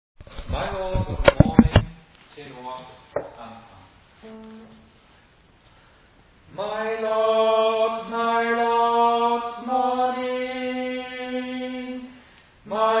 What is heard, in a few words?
Tenor Anfang